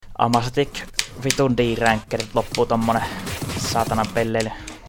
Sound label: applause; osu skins; Amasetic4.0; gnahus